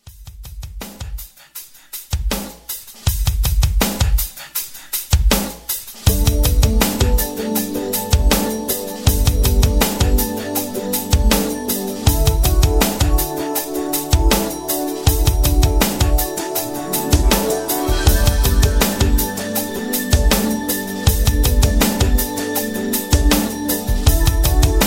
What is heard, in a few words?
Christmas 90s Re Drum 80